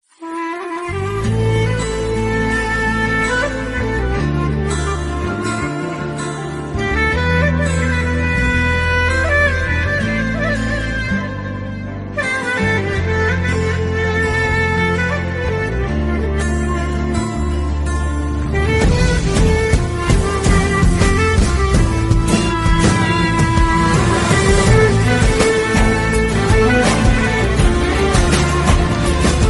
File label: music zang torki; زنگ ترکی معروف اینستا